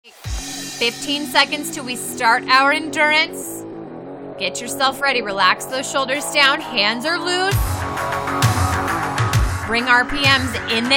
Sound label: Rock Class Sample